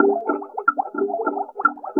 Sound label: Water Drum, Acoustic Beats; Sonic Foundry (Sony Creative Software); Myths of Technology